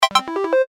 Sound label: line win; Step Back 7's